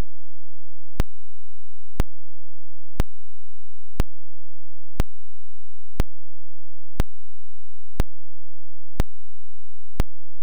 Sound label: pulse point5 hertz